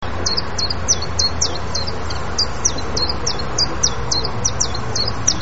tjiftjaf; vogelsflevopark

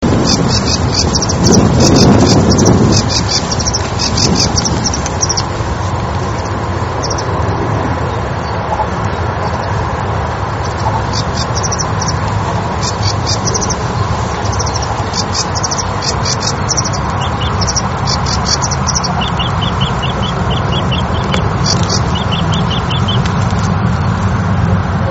rietzanger; vogelsflevopark

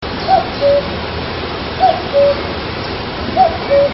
koekoek; vogelsflevopark

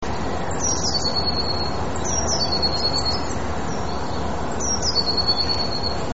pimpelmees; vogelsflevopark

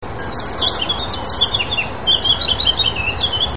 zwartkop; vogelsflevopark

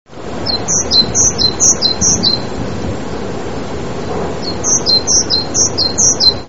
koolmees; vogelsflevopark

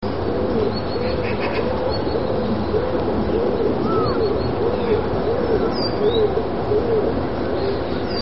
holenduif; vogelsflevopark

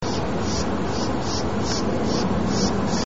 grasmus roep; vogelsflevopark

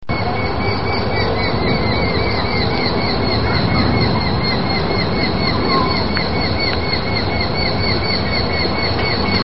boomvalk; vogelsflevopark

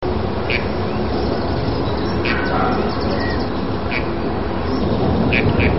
krakeend; vogelsflevopark

